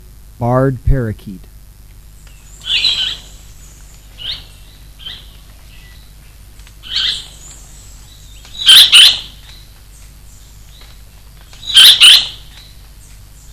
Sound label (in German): urwald kathi, die Rufe einiger Katharinasittiche hören, während sie in großer Höhe vorbeifliegen; Diese Aufnahmen entstanden in den östlichen Anden Ecuadors.; John V. Moore